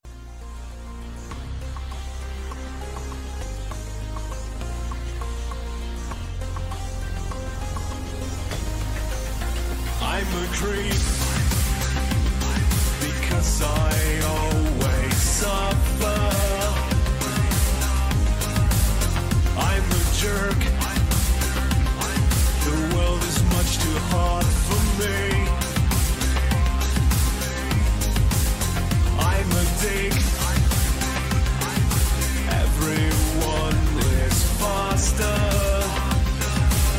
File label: Darkwave Electro Synthpop Industrial Synth